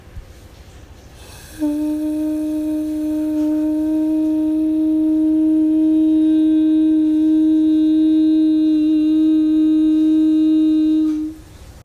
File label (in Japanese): 息漏れのある裏声から、息漏れのない裏声